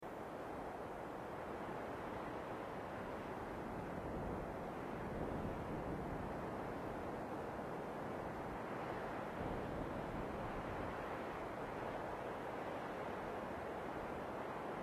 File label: ambient desert loop